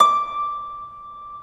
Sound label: Harp D5; Digital Sound Factory Virtuoso